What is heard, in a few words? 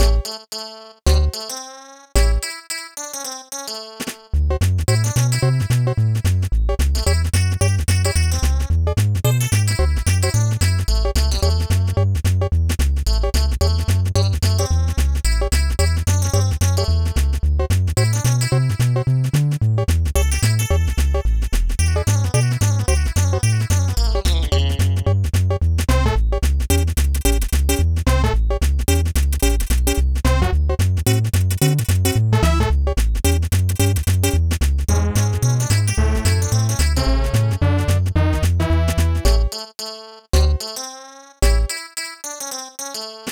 Rockabilly, Aircheck, Hi-Fi